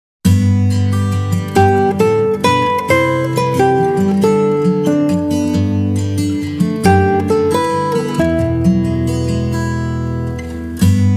Very Refreshing; Soundzabound Volume 4 Disc 3

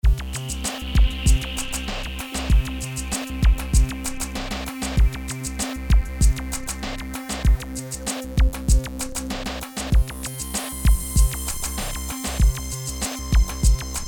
Techno

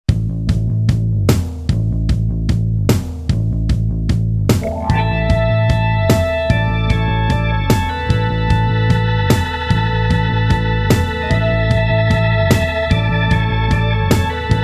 Guitar & Organ; Soundzabound Volume 5

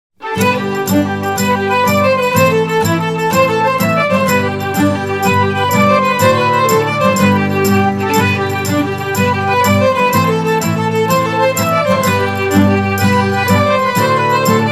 Irish Washerwoman Trad. Irish; Soundzabound Volume 2

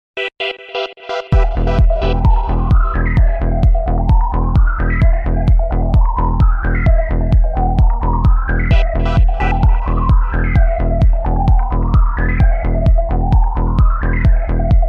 Underwater Party

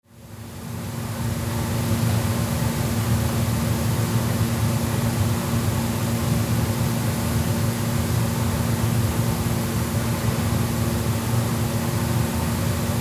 jacuzzi

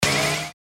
Burst Bounce

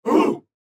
crowd hoo

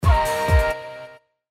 Beat Blast

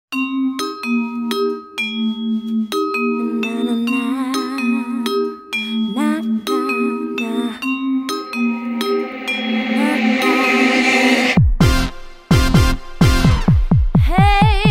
Queen of Pop, vocals; Soundzabound Volume 5 Disc 2

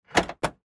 Car Trunk Open

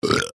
Burp

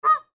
goose squawk

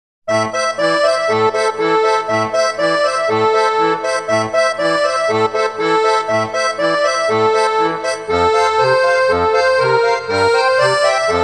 Le Vin De Francais Trad. French; Soundzabound Volume 2

